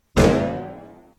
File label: Brown Box Sproing